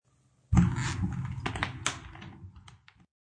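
Single Footstep Creaky Wood, Two short footsteps on creaky wood.; Human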